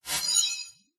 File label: game sound futuristic award unlock bonus